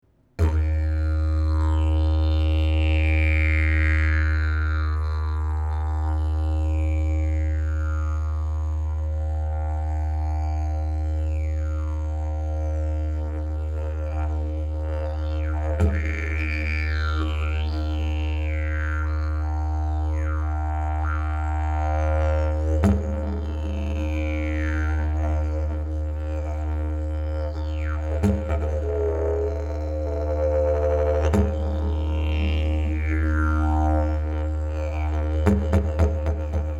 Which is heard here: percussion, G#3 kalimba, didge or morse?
didge